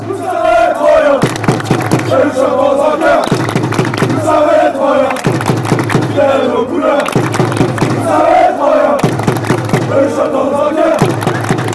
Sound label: colors, chants